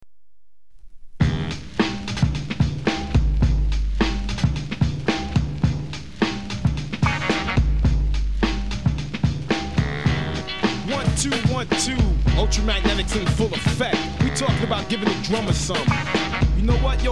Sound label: hiphop